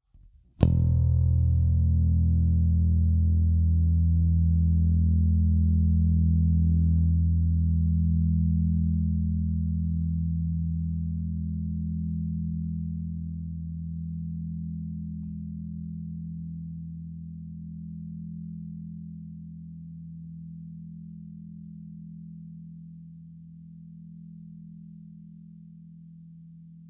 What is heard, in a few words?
Sustain